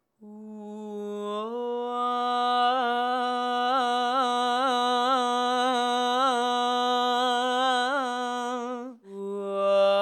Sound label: sing